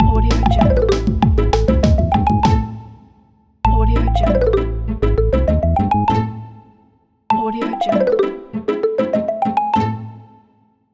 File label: funny logo